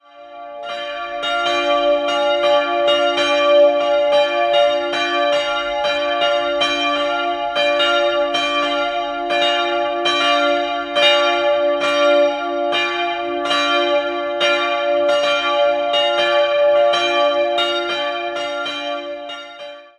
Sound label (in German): Ingolstadt Monika, Glocken